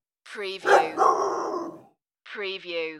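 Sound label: Huge Dog Rotw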